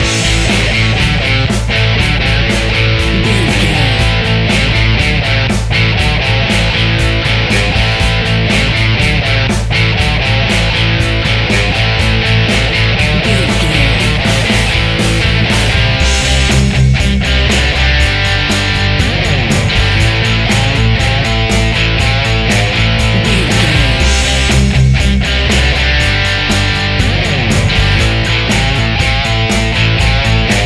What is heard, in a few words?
120 bpm loop